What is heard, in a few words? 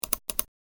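double clicks; electric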